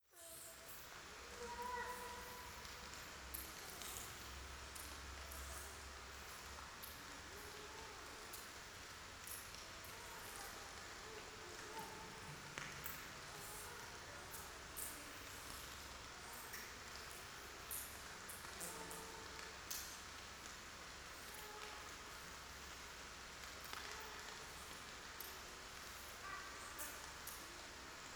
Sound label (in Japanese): イラマチオ 雨